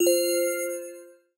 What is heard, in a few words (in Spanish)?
campana; Steven Universe - Beach City Drifters